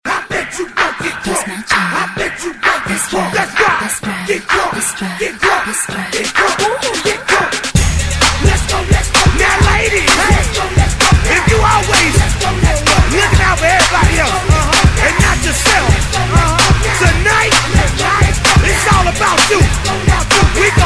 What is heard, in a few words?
cheer